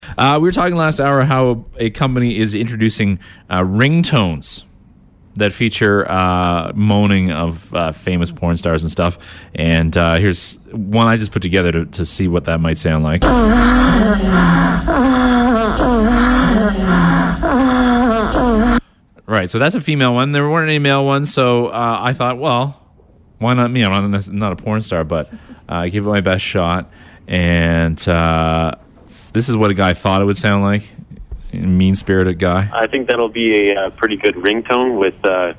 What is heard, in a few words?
Ringtones